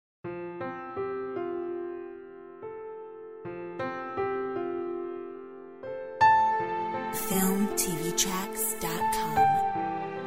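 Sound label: Whisperings Orchestra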